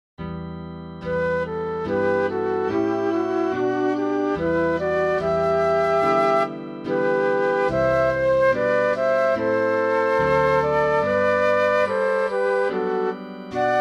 2 flutes; Psaume 101 (Hebr. 102); Variation 2

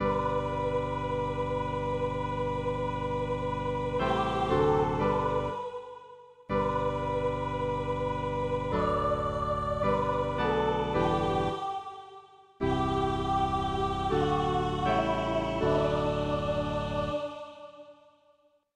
Psaume, Avec accords de guitare; Psaume 42 (Hebr. 43) pour les heures

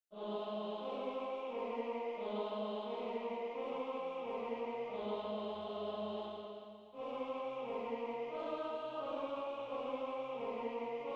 Harmonisation a 4 voix; Veni redemptor gentium

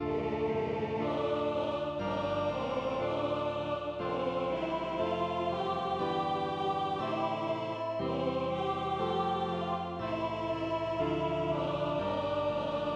Kyrie avec invocations prealables, Audio a 4 voix; Messe de Verrieres; Partition a 4 voix

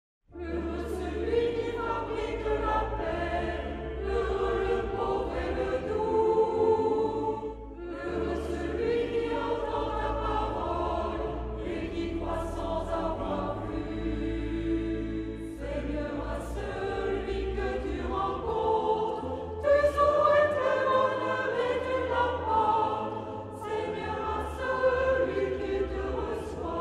Chant du Synode

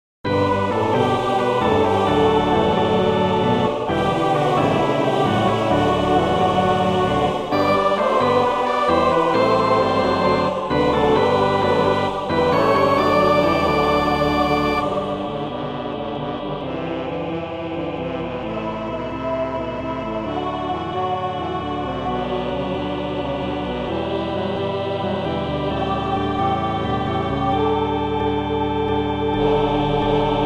Alleluia des montees a 4 voix avec schema melodique invocation a 2 vo; Messe de Verrieres

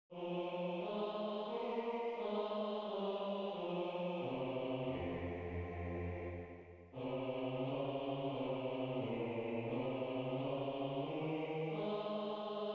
Harmonisation a 4 voix; Veni redemptor gentium

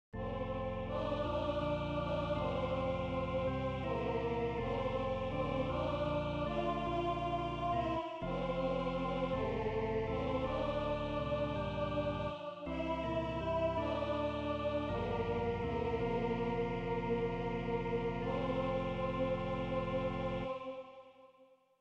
Psaume, vocale polyphonique; Psaume 115 (Hebr. 116 B)

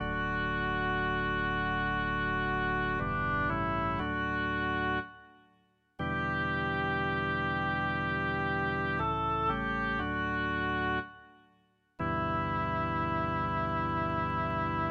Pour organ; Psaume 118 (Hebr. 119); Heureux ceux qui marchent suivant la loi du SEIGNEUR

